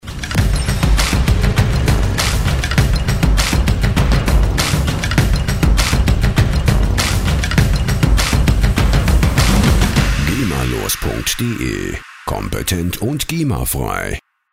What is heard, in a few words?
cinematic jungle